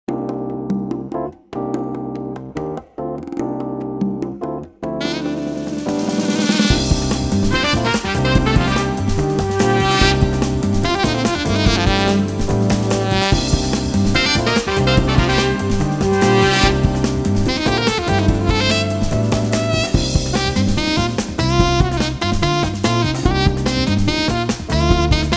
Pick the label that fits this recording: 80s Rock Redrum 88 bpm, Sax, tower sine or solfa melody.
Sax